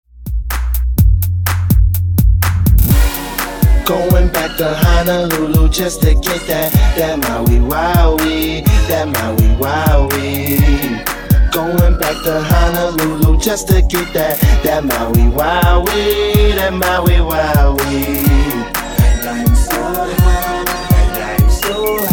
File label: Redrum